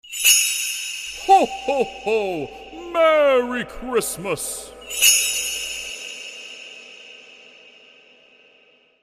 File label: christmas ringtone